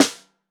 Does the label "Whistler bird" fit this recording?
No